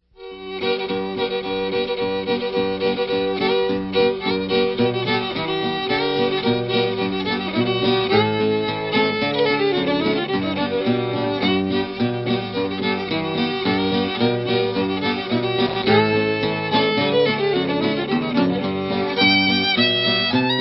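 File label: roaring river, banjo; Source Recording; Monte / Sano Crowder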